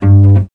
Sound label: Cello Pick; Timorozendal; pitchglitch